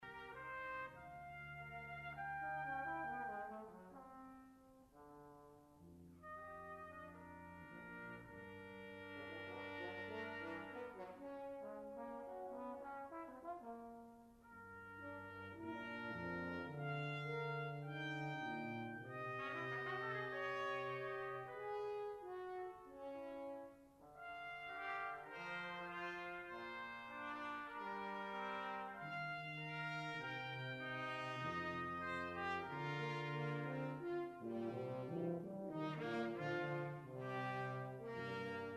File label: brass